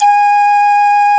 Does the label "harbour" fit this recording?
No